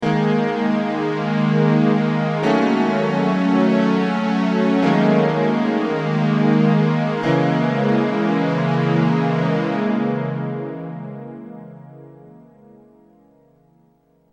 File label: Rockman stereo chorus